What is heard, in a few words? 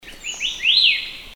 White eared Sibia; Heterophasia auricularis; song; Bird Call Recordings